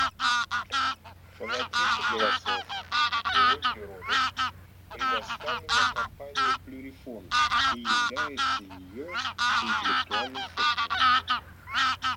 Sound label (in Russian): Гусь серый базар Oche Selvatiche; plurifon